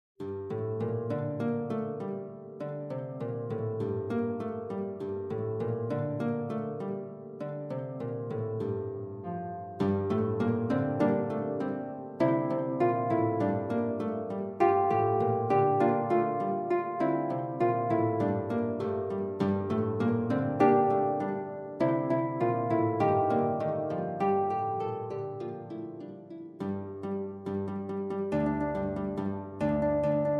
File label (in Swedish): klang regen, lute